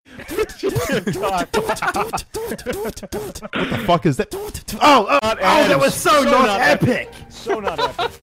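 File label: message tone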